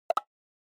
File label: Percussive Accent Mid; 打楽器アクセント